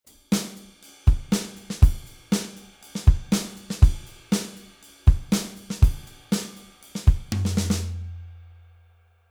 Drummer Color On 60Hz 3dB Bell 8kHz 2dB Shelf, Artistic EQ; Fredenstein